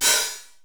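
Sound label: 909 open hi-hat; 480L; Wizoo; Platinum 24 Electronic; 480L BW 909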